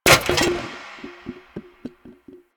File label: Vending Machine Bottles; Ambience; Props; Warframe; Nineteen Ninety Nine